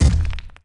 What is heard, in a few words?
gnome move; Akeron